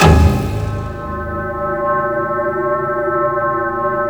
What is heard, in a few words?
G#3 harp; Northstar; The Wizard VOL-1; BLACK HAMMER